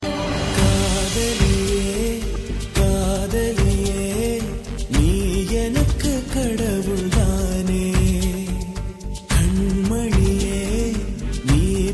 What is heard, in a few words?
Tamil Love Ringtone